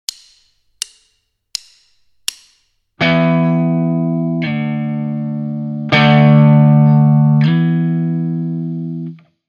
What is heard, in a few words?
only slide, Rhythm Guitar; Basic Rhythm Guitar II - "rock it!"